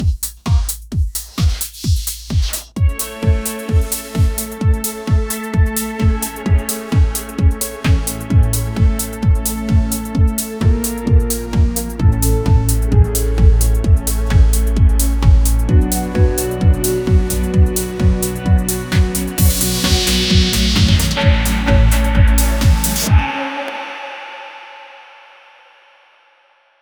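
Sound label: intro for a electronic music, build up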